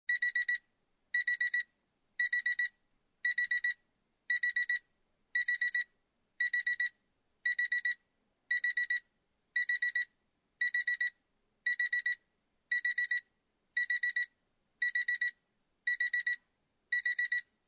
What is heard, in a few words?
Car Interior, 1996 Acura Integra, Door Ajar Alarm, No Engine; Transportation Automobiles